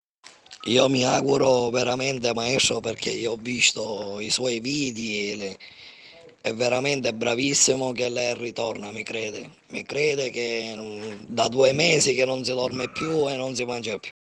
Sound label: B♭ minor bpm 109